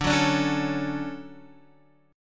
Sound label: D Major 7th, D, down, Guitar DADGAD